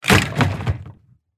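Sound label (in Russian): офисный компьютерный стул бьет людей падением звука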